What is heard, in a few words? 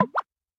Obstacle Picnichat; In Obstacle Picnichat